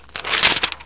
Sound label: PAGE; Rosegarden